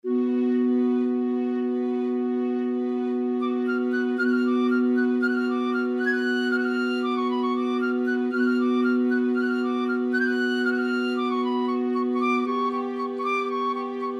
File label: orchestra